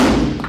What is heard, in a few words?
brick hitting metal